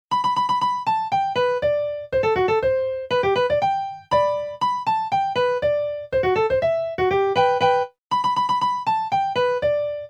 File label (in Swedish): Polka